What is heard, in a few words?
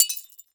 Shatter Blast; blastmorph pinball; xbox series xs